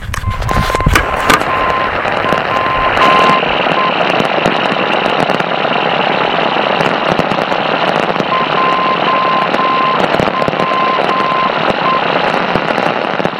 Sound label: beacon, amradio